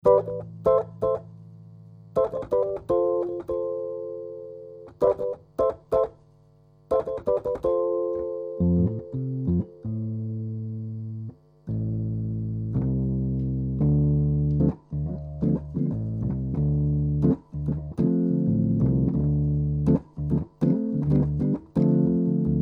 hohner pianet m switch off noises

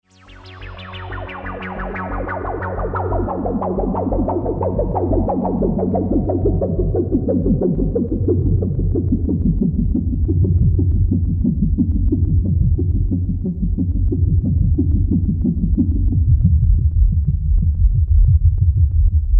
roland juno 60 bass

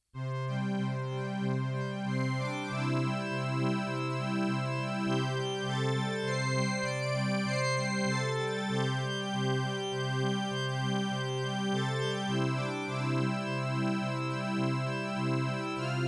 godwin string concert

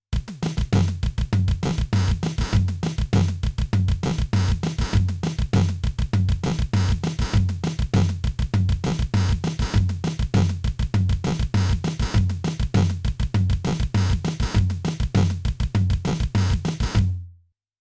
dynacord percuter test

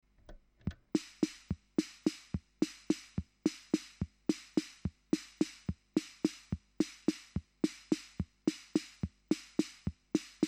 eko drums; eko micky

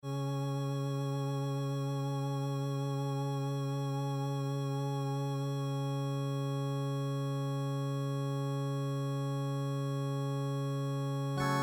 farfisa vip 400

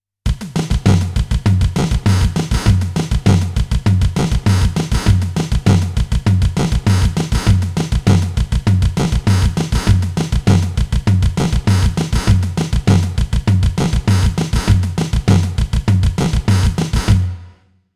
dynacord percuter test fx

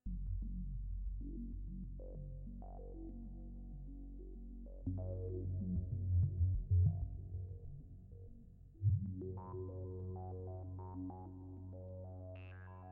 roland jupiter 8 c

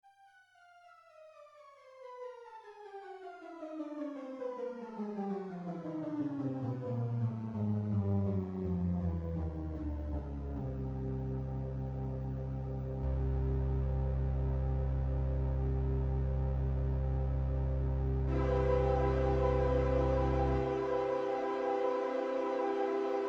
crumar performer

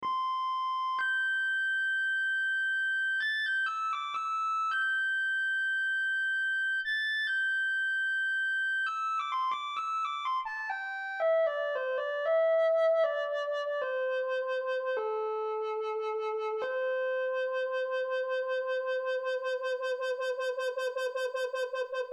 roland sh 2000 space flute

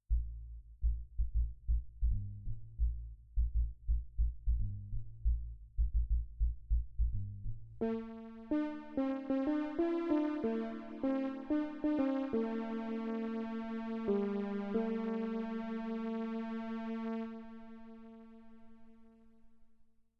farfisa string orchestra bass strings brass

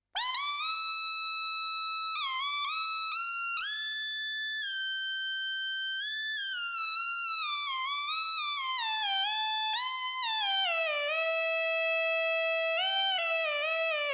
roland sh 2000 asiamoog

